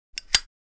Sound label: Zippo lighter close